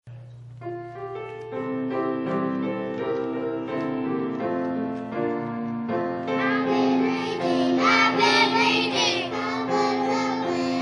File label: kids choir; Special Singing at EBC